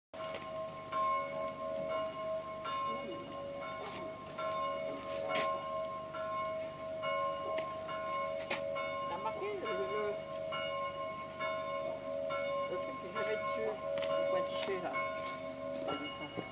COUTURE 16 CLOCHE

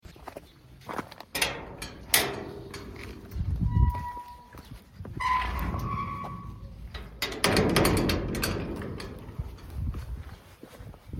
PORTAIL CIMETIERE SORTIE; VILLIERS LE ROUX 16

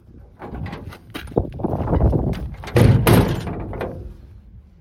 SORTIE, portillon; VANZAY 79; BRUITS DE PORTAILS

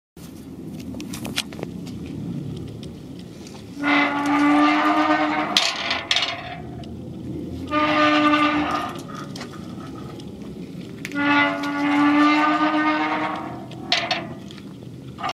BRUIT PORTAIL; PIERRE LA TREICHE 54; BRUITS DE PORTAILS

